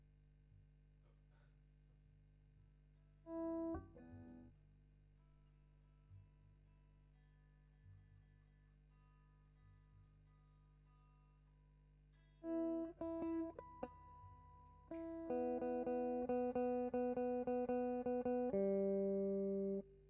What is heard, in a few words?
GTR B